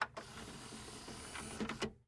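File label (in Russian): zvuki cd-pleera, CD player sounds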